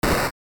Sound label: spikes; PPdastr Studio